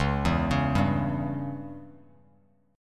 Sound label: Db Diminished Db down Bass Standard; arpeggiated; JGuitar